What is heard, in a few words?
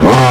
throttle blip hi, YAMAHA